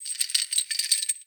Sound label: Chain Creak; Ambience; Dungeons; Under City